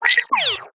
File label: hedge death